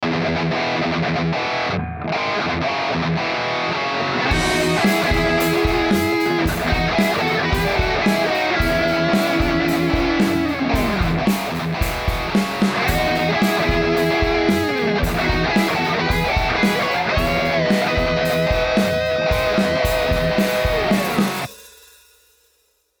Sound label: Guitar